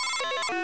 Orange Roll; Let's Discover Pennsylvania!

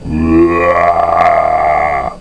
book ahh; kingarth

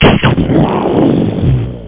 storm

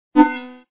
light sound; lightsout

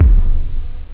echo, drums techno; OctaMED SoundStudio V1

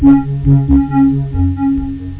PHUNKY-FLUTE; PhatTrax

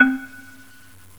Xylophone; GMTones; Chromatic Percussion

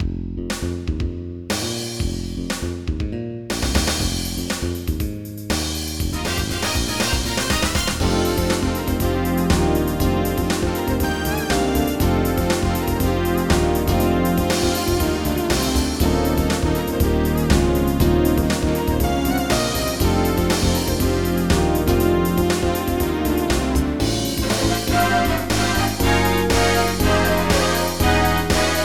POP

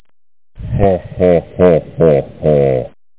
hahaha; Music Box 1994

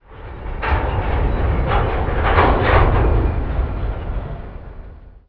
train coupling; SpecOps2 MPlayer Demo

